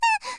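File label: girl, "kya"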